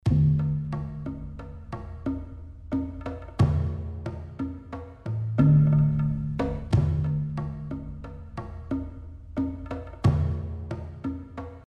music city day; baldursgate

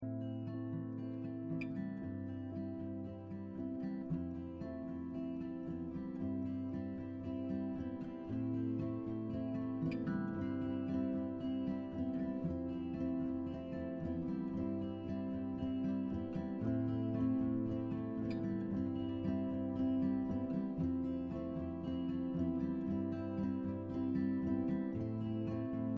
morning outside dorm musik; life is strange

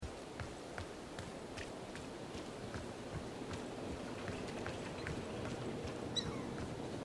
running gas station hintergrund; Life is Strange 2; gamesoundopedia

